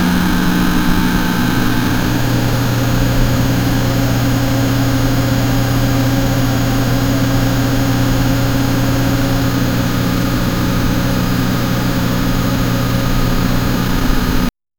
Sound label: personal computer startin